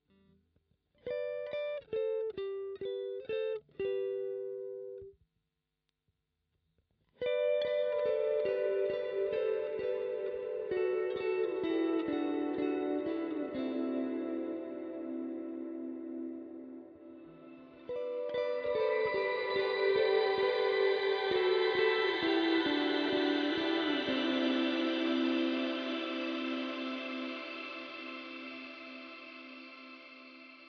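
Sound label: Shimmer V2 Riff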